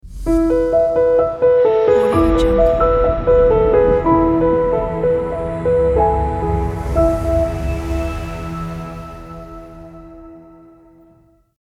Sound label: screensaver logo